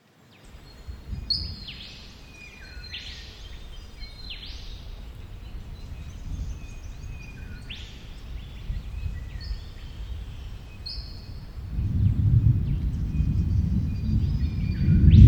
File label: Rain